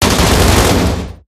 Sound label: lancerturret firewindup player; gow